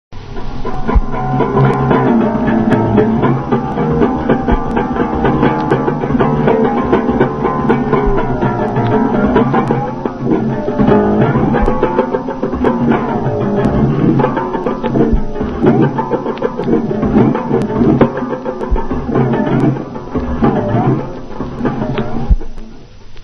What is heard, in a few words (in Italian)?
ronzatore; esempio sonoro; russolo